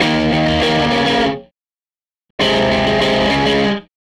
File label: Strat Guitar Loop